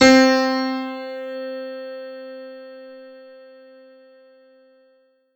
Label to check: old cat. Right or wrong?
Wrong